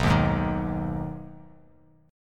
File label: B 6th B down, chord; strummed